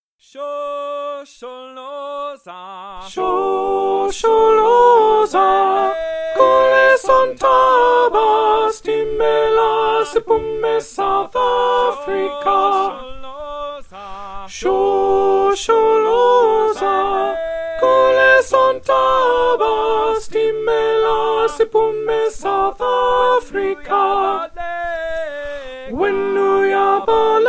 Shosholoza soprano

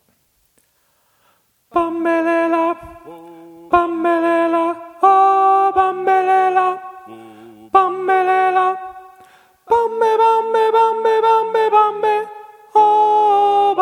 soprano

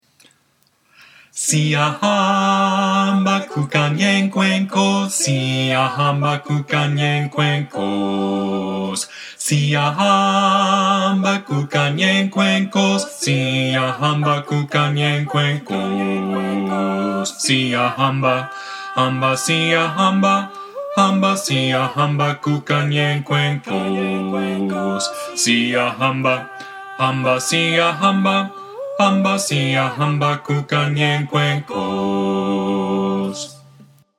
Bass; Siyahamba

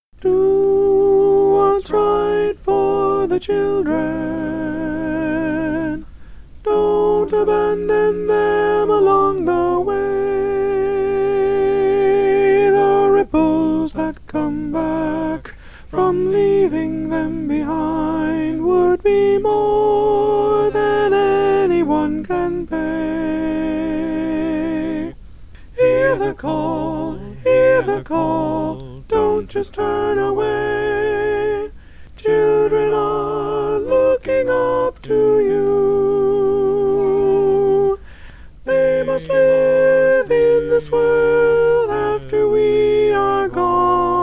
soprano

